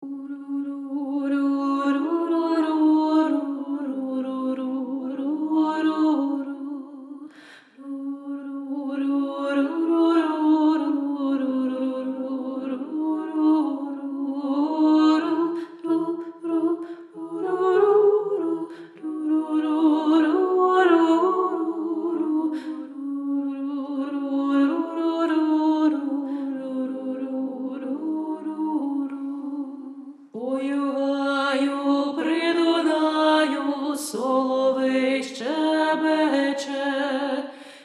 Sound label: Oi u Haiu Alto